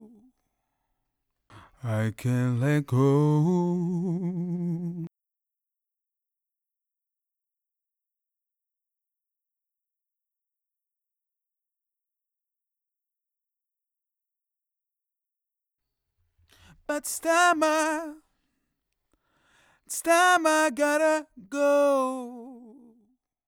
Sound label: Backing Vox